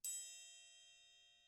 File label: triangle hit mf; Idiophones; Struck Idiophones; Triangles